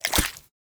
splat; soundscrate

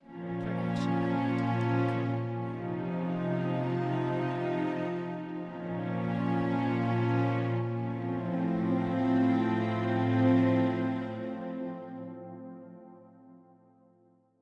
Mystery Piano; soundscrate

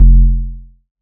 electronic kick distorted; SoundsCrate

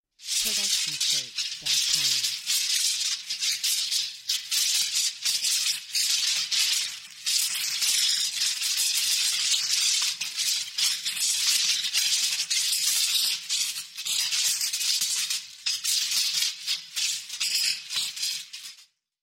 sparking lightning; soundscrate

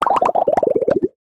Stylized Bubble Pops Descending; SoundsCrate

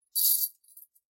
Chain Foley; SoundsCrate

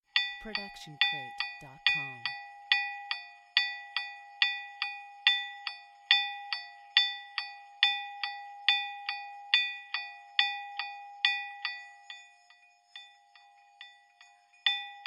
bell clock

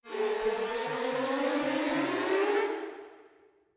gliss

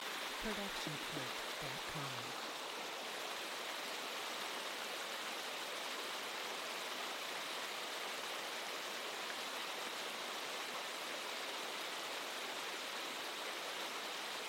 Rainy Day; SOUNDSCRATE

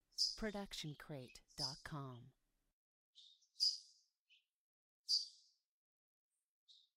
Multiple Birds Chirping; SoundsCrate

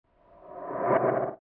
Dark Magic Movement; SoundsCrate

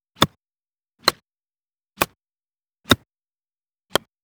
golf club pitching wedge mono; soundscrate

